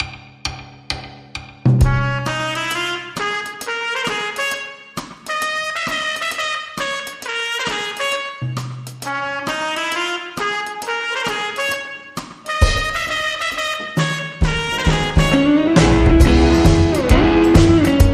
Instrumental

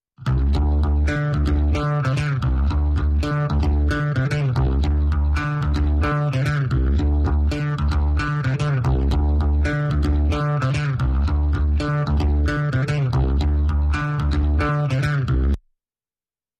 Bass Only

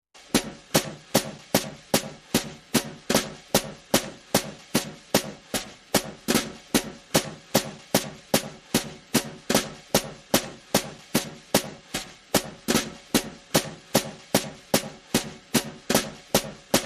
Drums Only

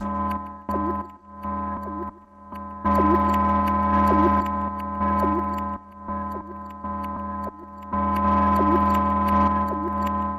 Signalling Wave Musical Pulsers

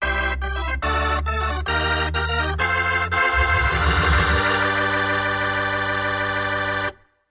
Organ Fanfare

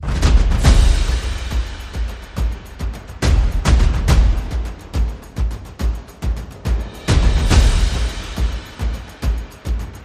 Percussion and Effects

